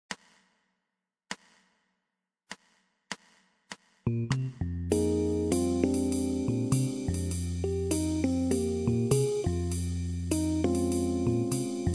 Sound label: with drums